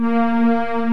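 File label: synth string; jazz jackrabbit 2; M8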